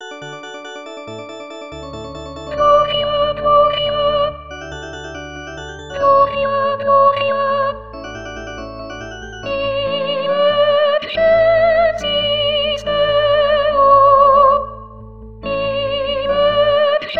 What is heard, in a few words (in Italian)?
Gloria Soprani; Vivaldi; anno liturgico